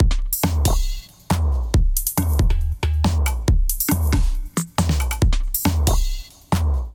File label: Kit groove 138 bpm; MIDI Clips; Uluru Sky Kit groove; Drive and Glow; Ableton; Factory Packs